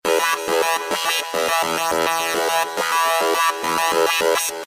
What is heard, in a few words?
Electro Sound